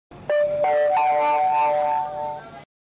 Madrid Subway

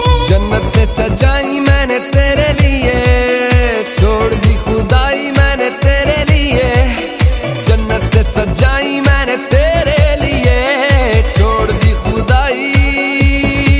Remix

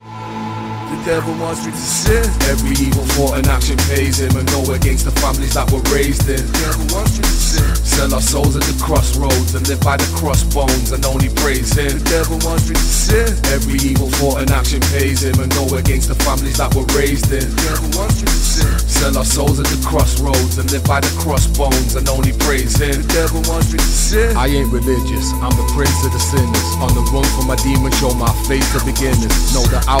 Jazz

